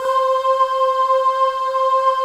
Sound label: AHH PADS; Pro-Rec - Synthsonic